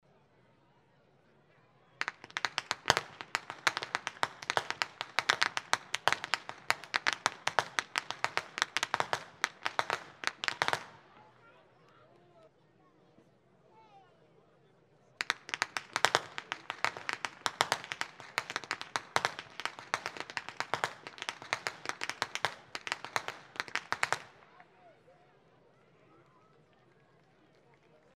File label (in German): schnalzen; jugend